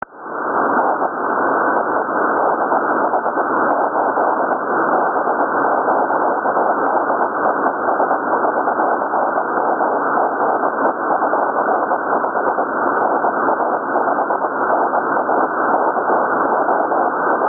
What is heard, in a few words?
qso